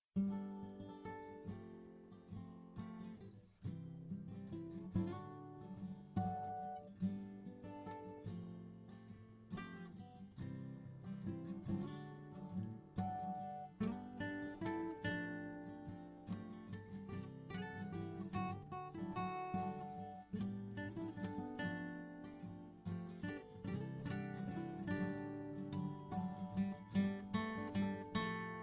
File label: music